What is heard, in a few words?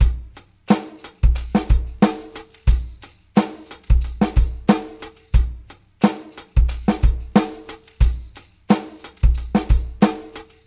Combo, R&B Drums